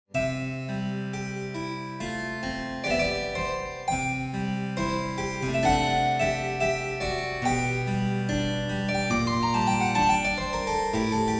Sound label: harp; dyna